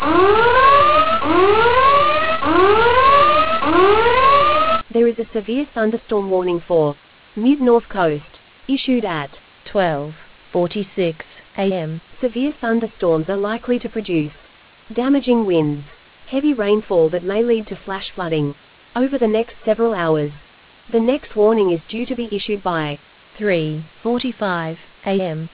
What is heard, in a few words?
storm audio